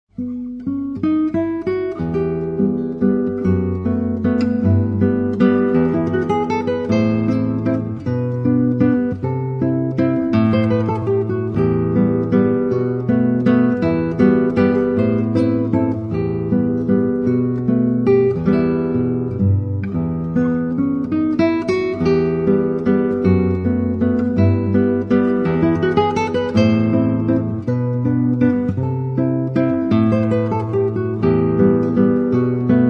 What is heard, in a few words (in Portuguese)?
valsa, guitarra classica